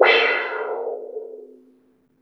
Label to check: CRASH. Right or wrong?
Right